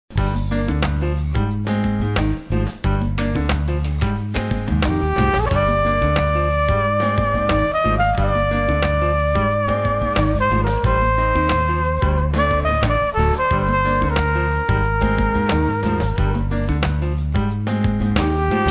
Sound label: New Jazz; on hold message music